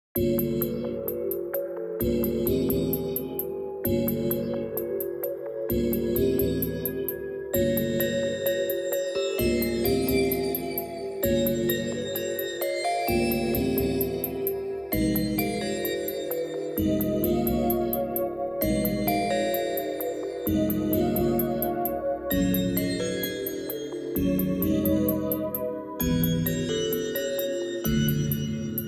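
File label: Weird loop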